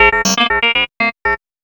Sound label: POLYMOD; AMG - Vince Clarke Lucky Bastard